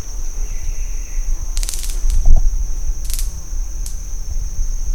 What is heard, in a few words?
Hamadryas empinome clicks